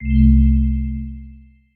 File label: Eye Heal Wave; Abbaderon